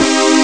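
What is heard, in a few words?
C Minor; rare gems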